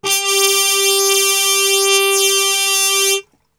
G 2 THM, Brass Super Section, TRP HAR MUTE; Best Service; 90 s Sample CDs

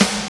snare bend; Roland L-CDX-01; Snares 5; Sn Modules 5

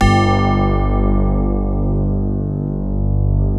PIANOBELL G1; E-MU Formula 4000 Series Vol. 3; Analog Odyssey

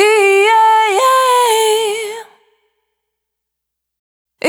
Urban, Single Voice Melodic Loops, Vocal Samples

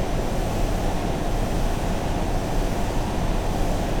InfiniVerb Noise, sparse soundscape Loops

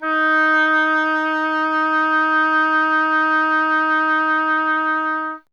WND OBOE, Solo Instruments

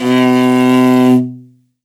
R, morin khuur, World Instruments; Best Service ProSamples vol.52 - World Instruments 2; AKAI

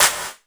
BG CLAP, METALIC DRM; Crypton - DrumBase5000; METALIC DRM2

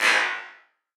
Wider Clap; stereo toolkit samples

